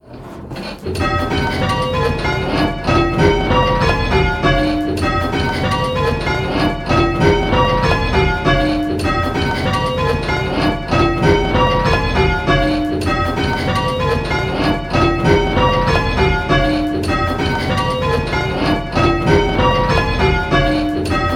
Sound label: 6-Bell; Who's leading; Which Bell is Leading?